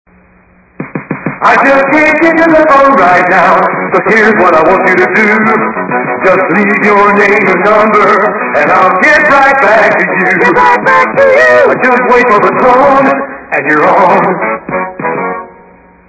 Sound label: 50s rock roll; RadioShack